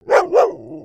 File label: doberman bark; creatures pets